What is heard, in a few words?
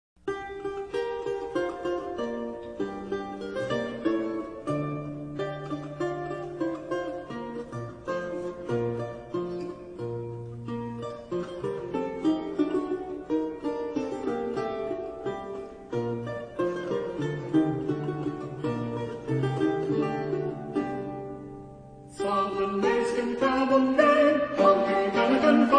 flemish renaissance